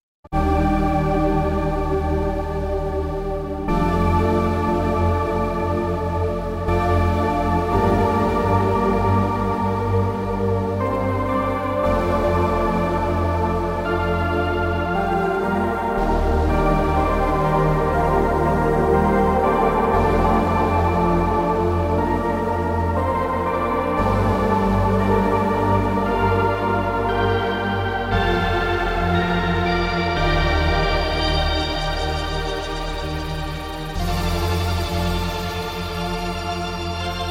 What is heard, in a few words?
Orchestral Syntetic; Cypher2; FXpansion